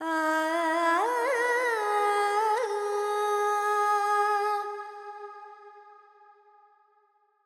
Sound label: FX Phrygian, Carnatic Female Alaps; Sample Banks